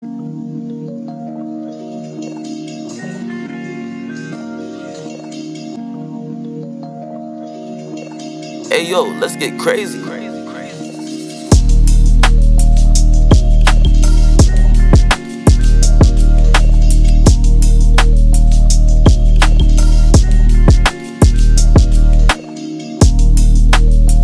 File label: Type Beat 167 bpm